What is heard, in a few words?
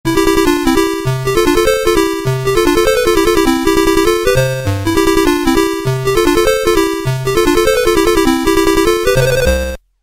stage 1 clear music